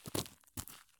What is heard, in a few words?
soil turn; Escape from Tarkov